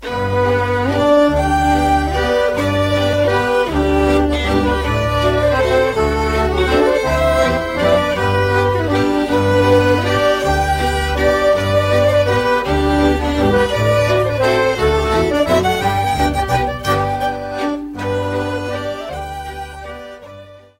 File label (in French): VALS, Danemark